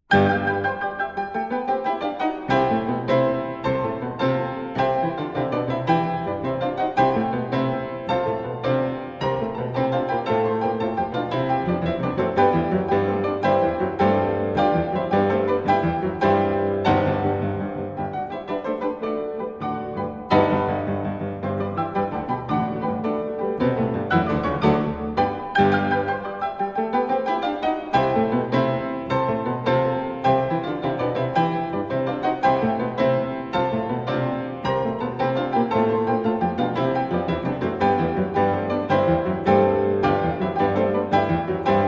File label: sonate in c major scherzo